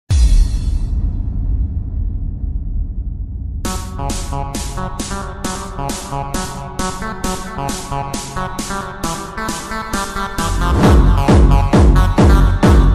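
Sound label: slowed bass boosted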